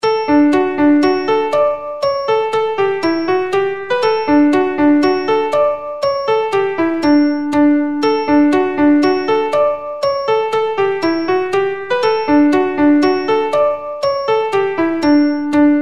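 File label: Polka, Traditional Irish Music; Aileen Bonners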